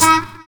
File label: HARMONICA